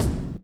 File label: hardly, Kicks